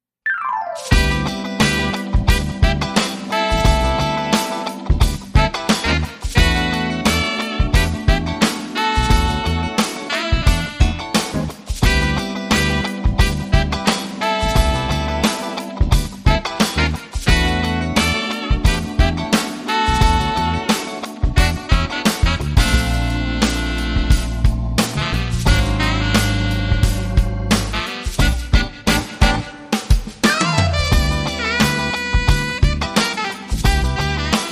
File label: Saxy